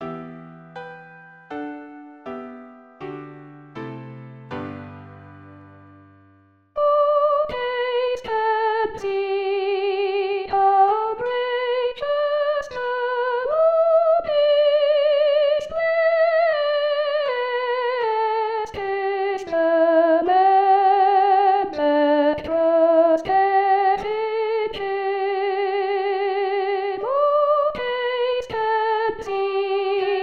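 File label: Soprano; O taste and see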